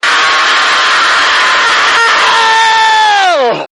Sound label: scream